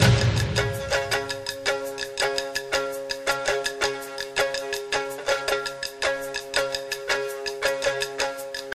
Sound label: freespin Wait Loop; shangrila